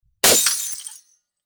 Glass breaking

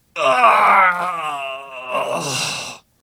Groaning in pain

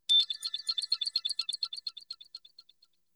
UI beeps; Sound FX

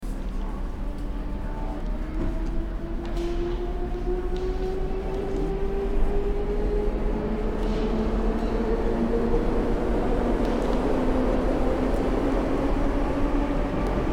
Subway ambience

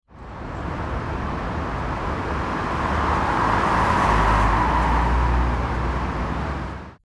City traffic

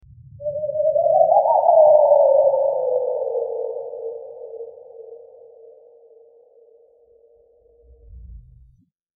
Alien jungle; Sound FX

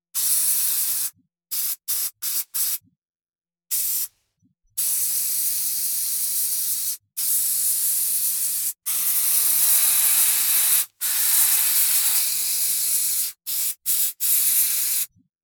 Graffiti spray can; Sound FX

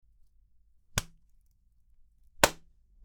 Slap

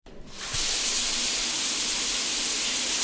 Shower

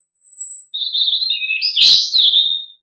Bird chirping

